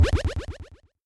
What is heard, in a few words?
super Jump; Dino Run